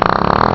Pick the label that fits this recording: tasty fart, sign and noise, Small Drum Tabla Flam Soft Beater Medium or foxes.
tasty fart